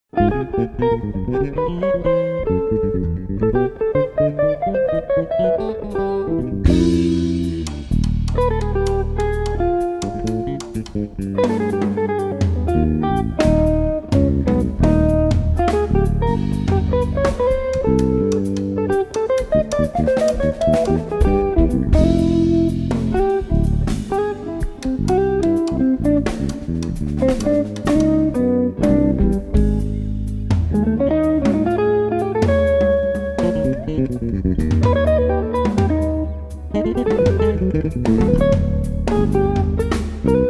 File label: Melodic Min Mess Samba